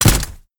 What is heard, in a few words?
jump bullet